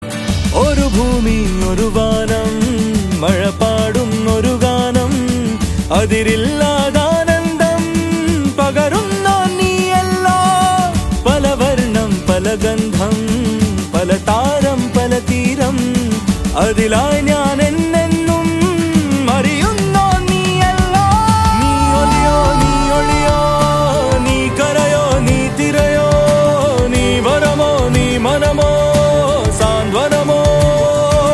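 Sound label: Song background music Ringtone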